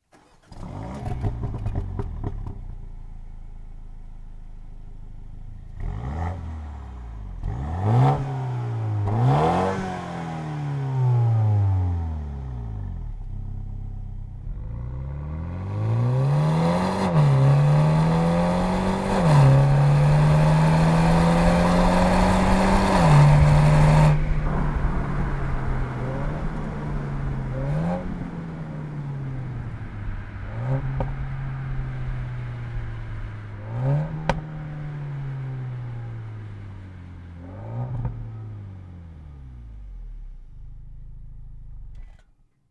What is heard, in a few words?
Sound Stock exhaust BMW M135i; Akrapovic Evolution Link SS; BMW M135i F40